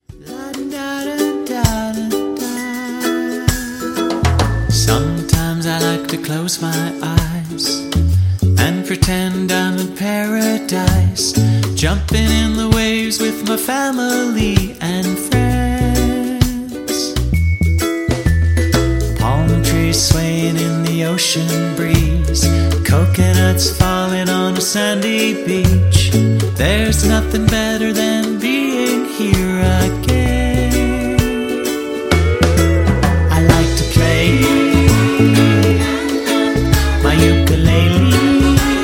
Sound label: My Ukulele